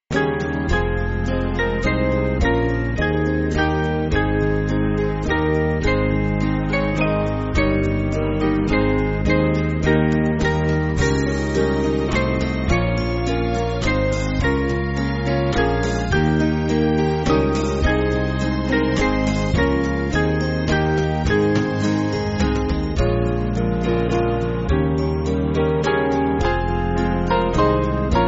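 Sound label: Music Backing Files for Churches; Submission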